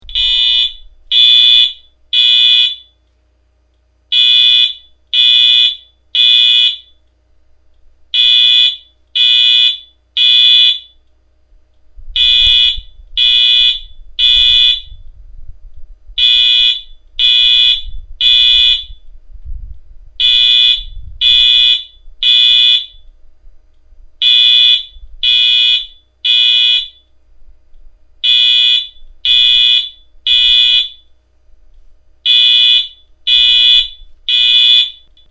wheelock mt4 115 wh code 3 tone, alarm

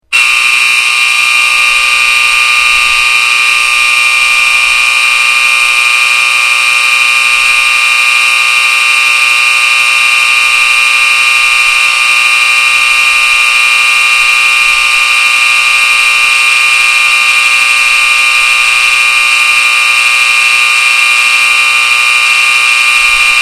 edwards 874 n5, alarm

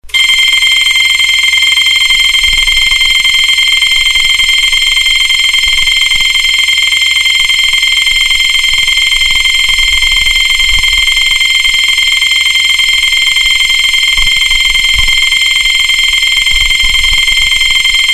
cerberus pyrotronics mtl s15 s bell

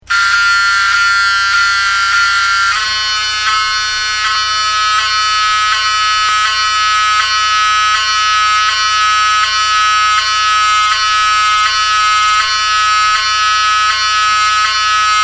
wheelock 7002

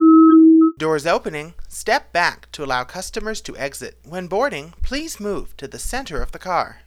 doors opening chime

